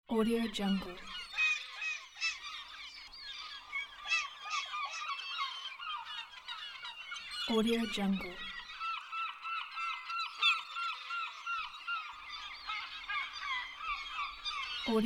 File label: seagulls